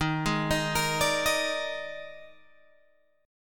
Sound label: Eb Major 7th Suspended 4th Eb, Guitar, Open G